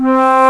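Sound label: woodwind C1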